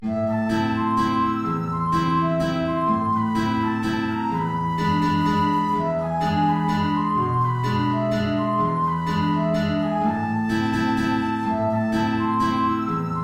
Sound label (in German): Querflöte & Gitarre